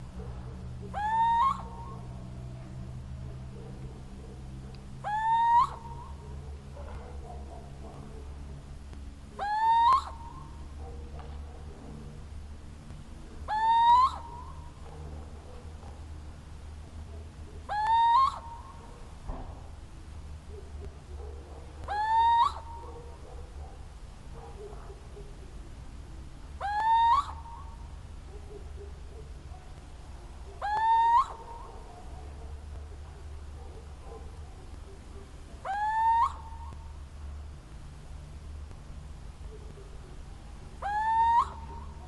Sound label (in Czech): Sycek obecny teritorialni hlas